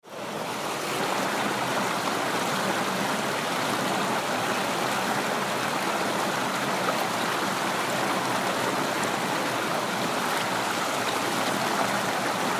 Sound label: water